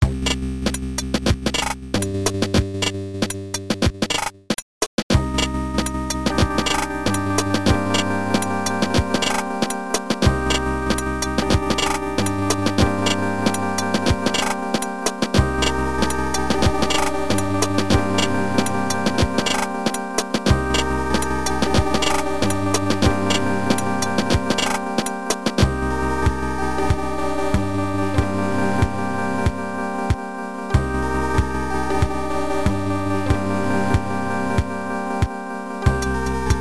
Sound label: clang